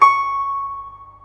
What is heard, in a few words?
GUZHEN C#6; Spectrasonics; Heart of Asia